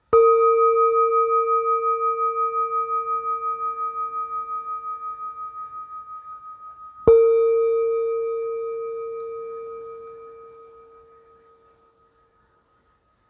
262, tibetska misa 262g

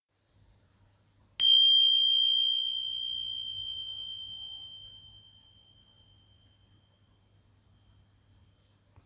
tibetske cinelky male hladke

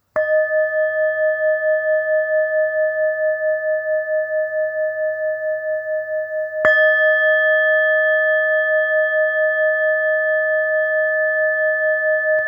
chopa, tibetska misa; tibetska misa choepa 266 g

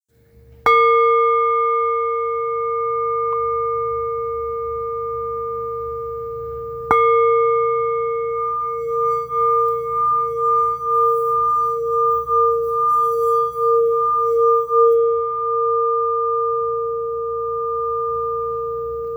684g buddha, tibetska zdobena misa 684g buddha

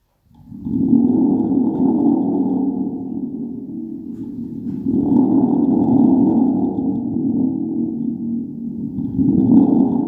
hromovy buben; hromovy bubon velky

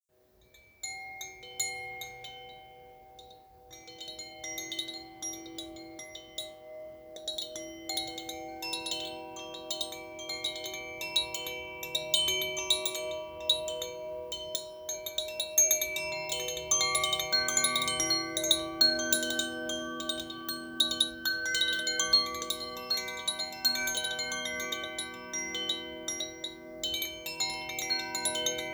cakrova zvonkohra korunna cakra

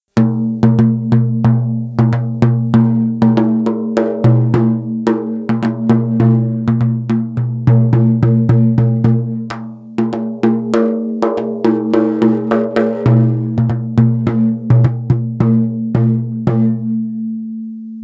thunderdrum ruka; samansky hromovy bubon 40 cm

